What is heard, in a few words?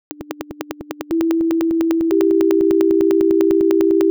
cmajor transformed